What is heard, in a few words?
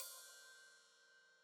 Hat Open, Hi-Hat